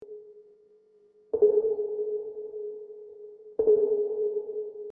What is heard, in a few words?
submarine sonar